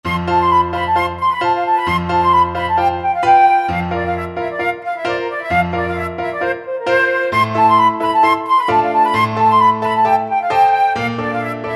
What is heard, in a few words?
romanian folkdance, flute